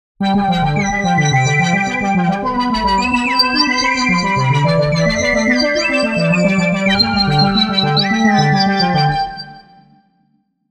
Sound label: fantastical electronica